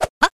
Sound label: symbol pass; jingle spin